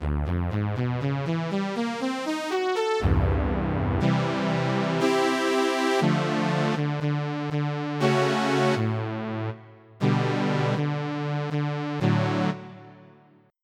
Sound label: Saw Wave; EverQuest